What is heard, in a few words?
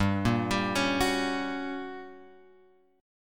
G Minor Major 7th Flat 5th, G; GmM7b5 Chord {3 1 X 0 2 2} arpeggiated